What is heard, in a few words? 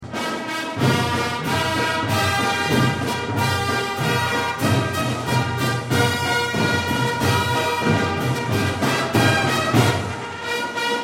Silver Trumpets